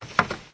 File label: Picture frame